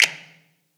snap big trap; Percs

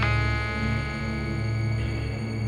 Synth

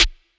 Snr

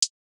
HH, Closed Hats

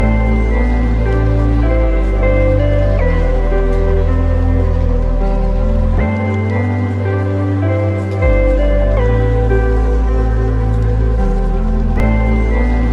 BPM 80

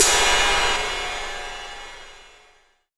Cymbal; Crash And Cymbal

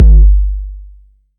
808's

